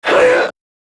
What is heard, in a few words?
Pain Grineer Manic; Battle Barks